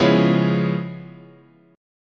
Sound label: Db 7th Sharp 9th, chord